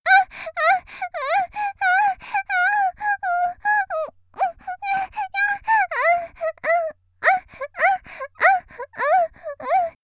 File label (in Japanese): 「喘ぎ」ノーマル, 少女; 元気な少女